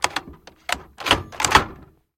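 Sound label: heavy reload of a powerful heavy weapon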